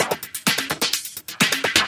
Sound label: VFX2 Loop 128 BPM